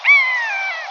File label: buteo